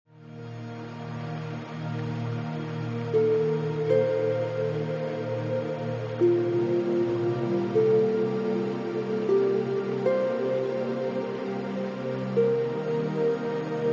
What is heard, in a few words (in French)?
Musique de fond, Calming Music Nature Sounds Relaxation